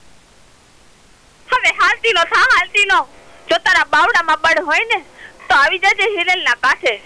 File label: Gujarati Ringtone